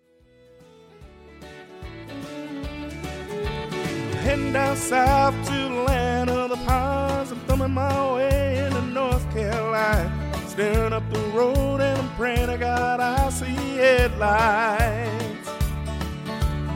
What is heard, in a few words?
Country